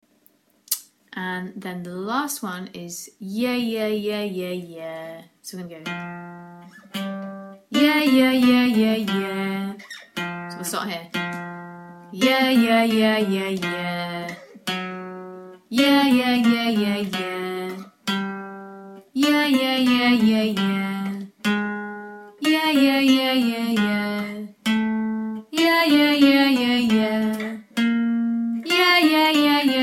chest voice exercises yeah yeah yeah

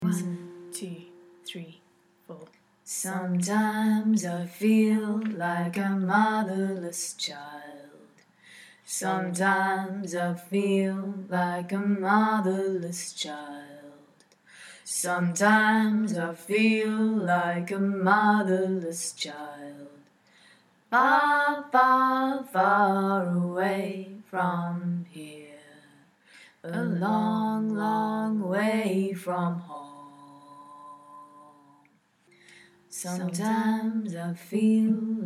lower voice; motherless child

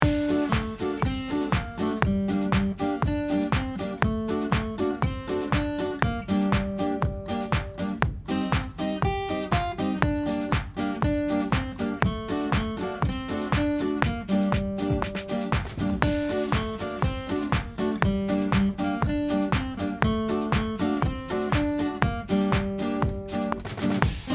Balkanarama chords and melody 120bpm

